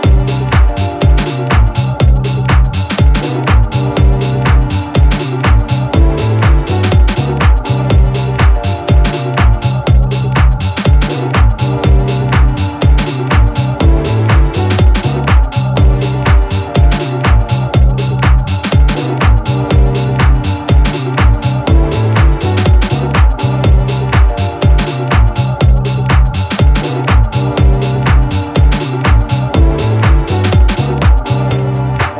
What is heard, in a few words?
instrumental mix